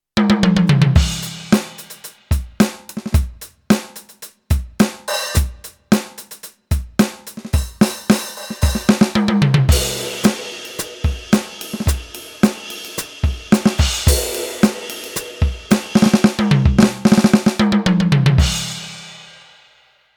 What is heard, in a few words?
Garage kit; alesis nitro ultimate